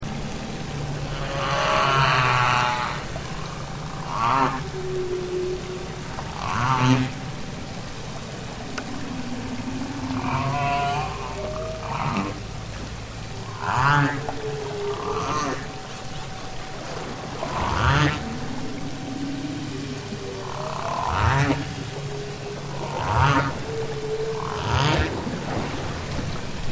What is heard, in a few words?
whale song echo